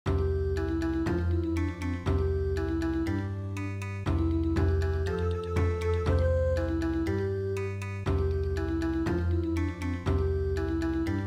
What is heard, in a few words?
Bon Odori; donguri korokoro